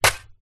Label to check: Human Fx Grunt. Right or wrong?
Wrong